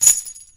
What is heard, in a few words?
chain impact soft, metal; physics; Source Engine; cstrike